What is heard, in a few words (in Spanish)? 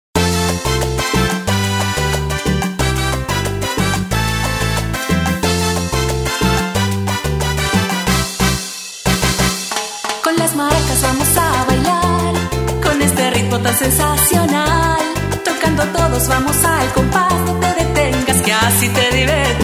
Tocando maracas